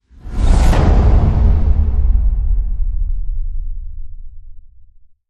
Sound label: Hit Crushed